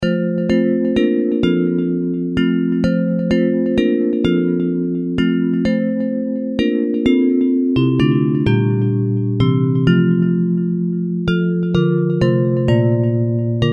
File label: Bells